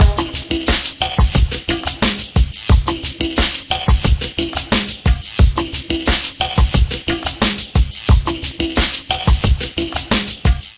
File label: 089 bpm deep verby brazil, drum loops and breaks